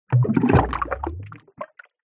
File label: nature water bubble rise underwater